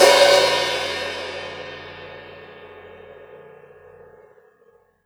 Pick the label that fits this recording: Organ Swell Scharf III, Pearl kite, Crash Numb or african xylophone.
Crash Numb